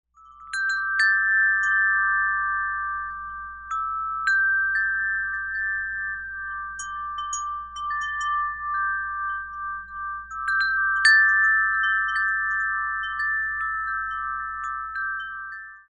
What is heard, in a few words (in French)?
carillon moyen habitat libellule bronze